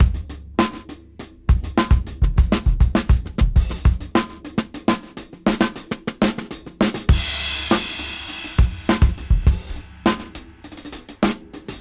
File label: Tricky 101 BPM; AMG - Keith LeBlanc Phat Butt Jams